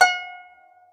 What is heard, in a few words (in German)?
HAKENHARFE; SoundBytes; Early Patches